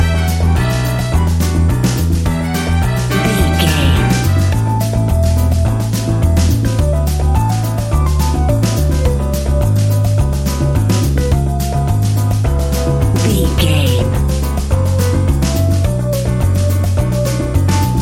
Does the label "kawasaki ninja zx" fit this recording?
No